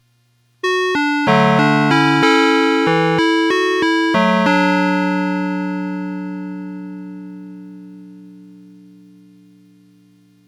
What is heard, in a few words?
opl2; familymart